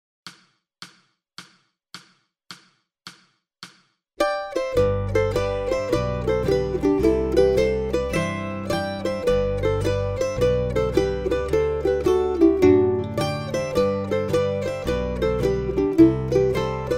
Mandolin Duet Slow

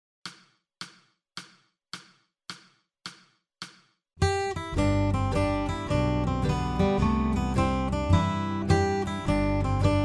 Guitar Harmony Slow

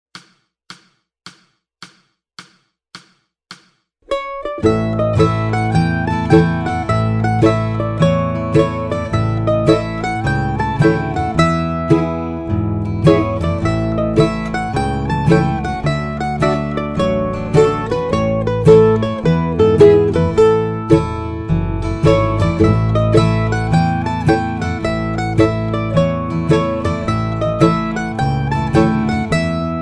Slow Mandolin; Old Joe Clark

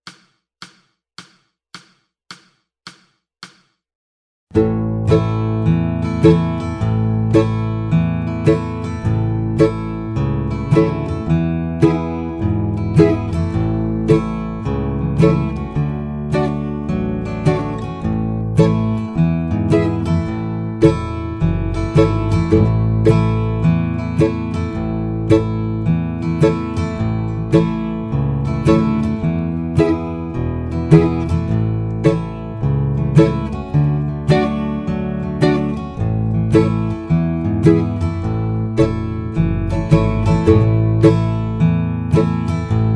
Old Joe Clark Slow Backup

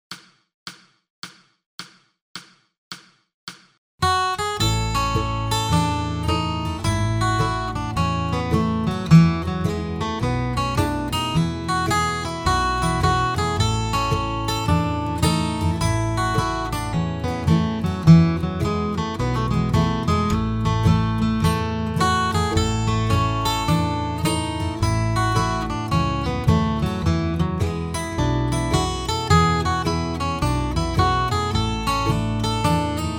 Slow Guitar

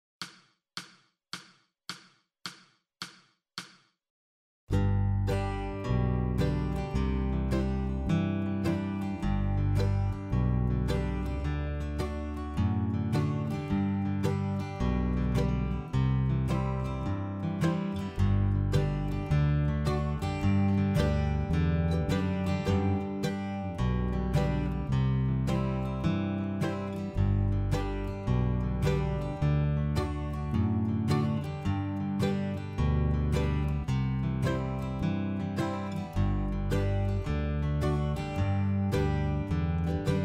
Rhythm Slow

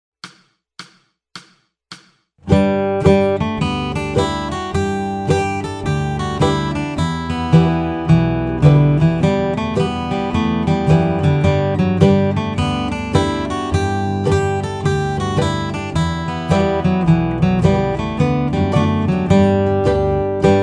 Temperance Reel Slow Guitar

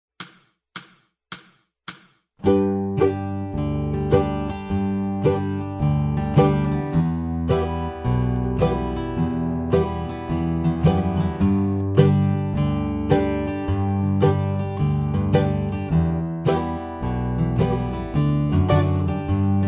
Temperance Reel Slow Backup

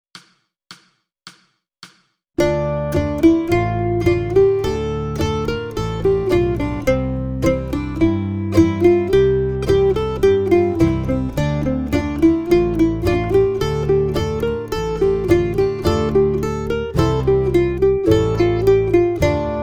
Mandolin Slow

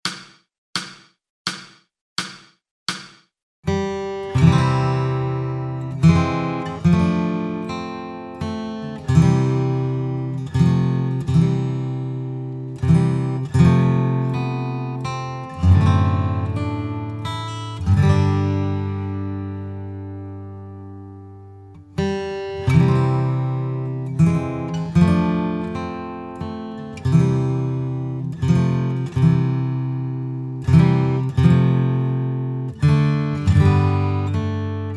midnight clear guitar solo

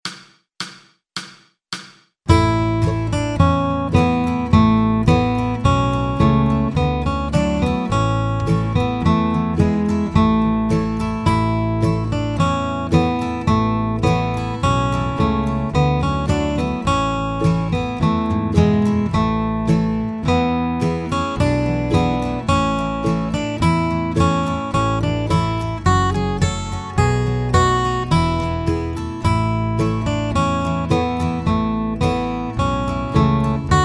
deck the halls guitar basic

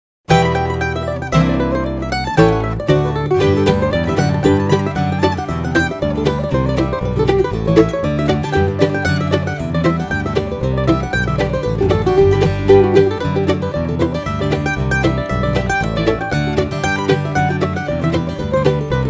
Intro Jam Mando

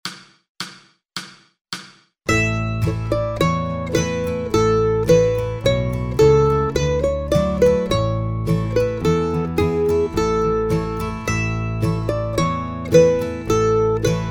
deck the halls mandolin basic

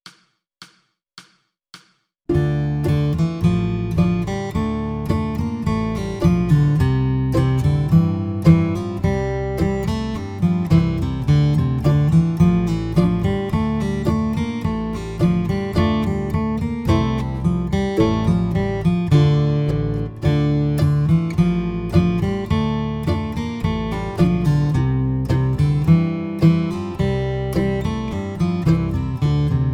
Guitar Slow

